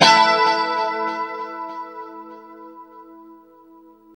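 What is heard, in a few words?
chord A 4, guitars; Spectrasonics; Hans Zimmer Guitars VOL-1; JC-SHEEN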